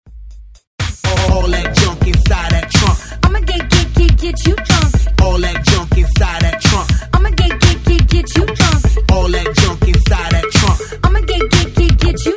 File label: Top40